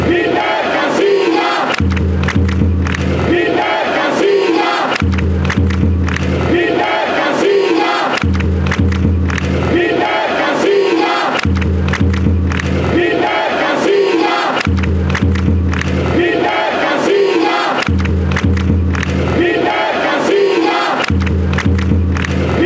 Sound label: fanchants; casillas